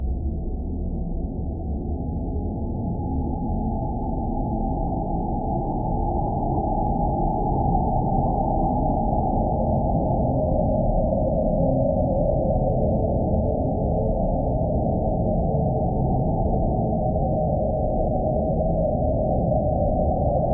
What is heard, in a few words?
silent horror ambient sou